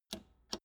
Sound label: old button half pressed